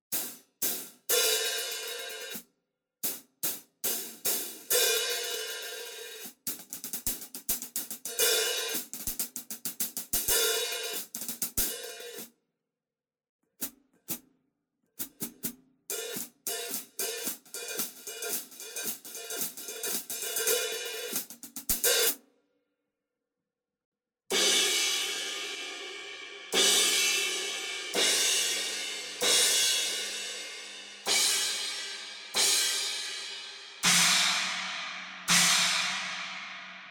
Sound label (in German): Fame MS Drumkit EZ Overheads Hihat Cymbals alleine